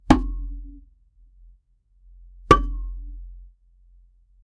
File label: a deep sound of gavel; judge